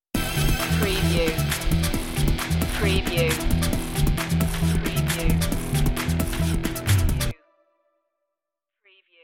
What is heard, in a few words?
burn out stinger